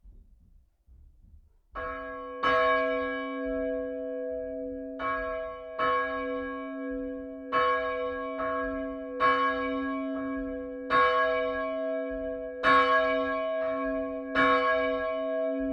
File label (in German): glocke blesewitz